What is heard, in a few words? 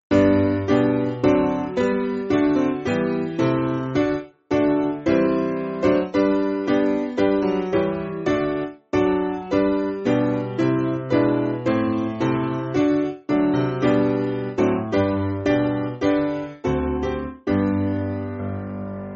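Piano, Backing Files for Churches; Creator Of The Stars; Brockham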